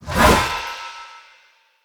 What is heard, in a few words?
Great Sword Throw Perfect; Weapons; Player; Swords; Mendicant Great Sword